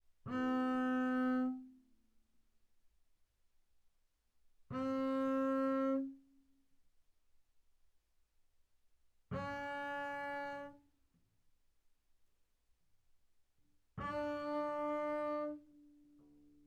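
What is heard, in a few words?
Bass arco sul D fortissimo B3 D4, double bass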